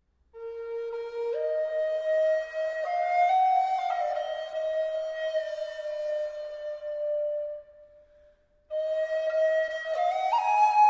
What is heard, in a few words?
Sephardic Song, for Solo Recorder